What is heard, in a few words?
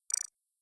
door button; ze amongus dp